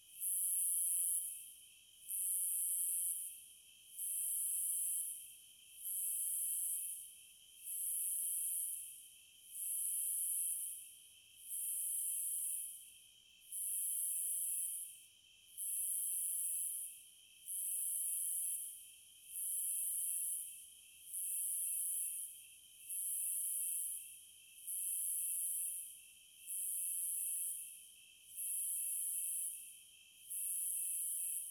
cicadas; Nature Sounds; Sounds of Nature Collection

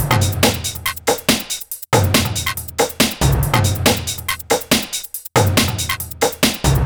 No Kik Loop, Dance, Beats

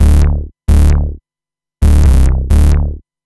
bass, dance; Basses

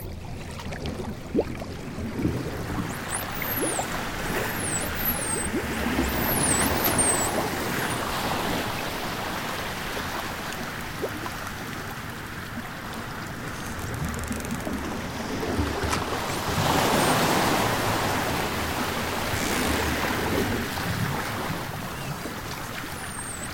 Shoreline - Open Seas; OCEAN; Sounds of the Earth; Sleep Sounds of Nature

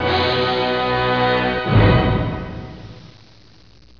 TROMBON B, ORCH HITS; MUSIC

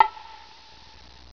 BONGO

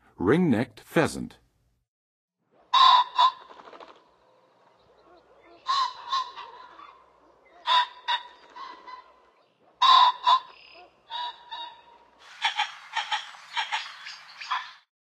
Ring-necked Pheasant; Nature Songs; N. America; Songs of Birds of North America; Western USA Songbirds

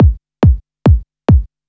Kik Loop, Dance, Beats

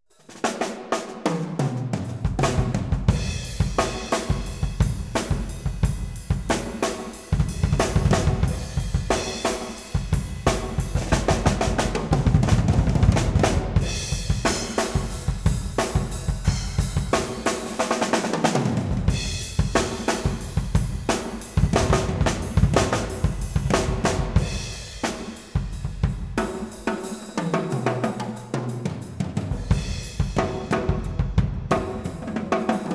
Drum Solo; World Music; Isomike Super Test 2008A; Audiophile Sound Italia

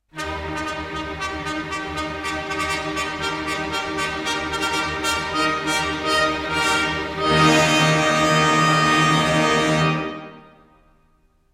Fanfare; Gilbert & Sullivan; The Mikado; Opera

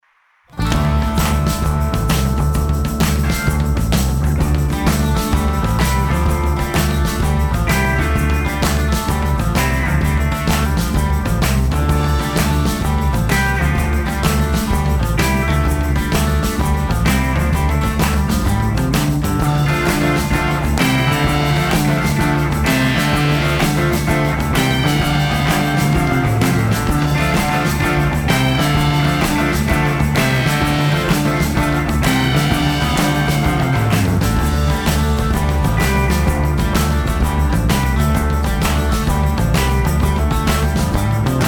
Instrumental

